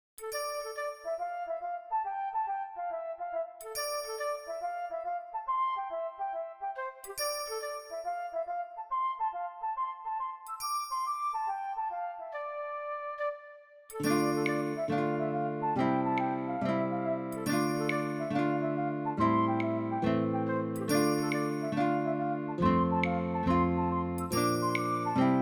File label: Tavern, midi rip; original